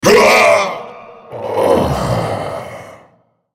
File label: dying; nasusskin05; Character Voices - English